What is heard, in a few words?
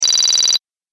Game App Fast Beeps; video game; Video Game Sound Effects